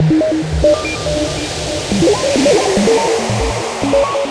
waters edge, korg

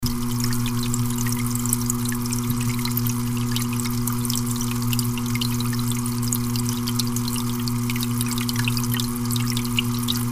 fishtank

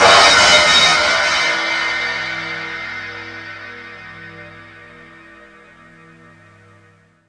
cym, drums

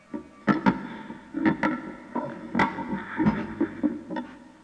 noise

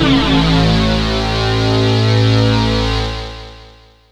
dirty sync, korg

